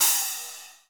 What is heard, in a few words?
Crash; Equipped Music; Loungin House